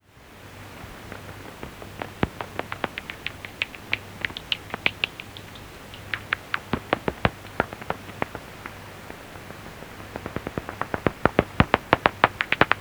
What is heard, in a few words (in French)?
Chiro pipistrelle commune